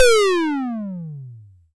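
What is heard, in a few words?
SYNTOM HI F, analog toms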